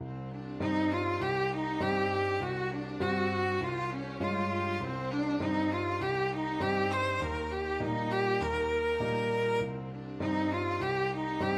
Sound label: mountain king, piano quartet; grieg